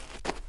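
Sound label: shuffle, footsteps hard surface jump up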